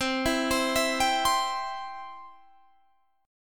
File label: C Major